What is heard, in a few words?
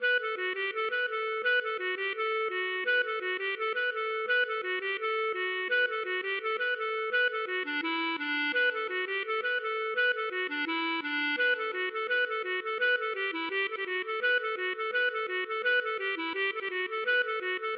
gavotte